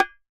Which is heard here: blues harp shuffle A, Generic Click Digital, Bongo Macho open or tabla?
Bongo Macho open